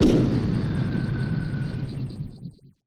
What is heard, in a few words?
alien hover bomb explosion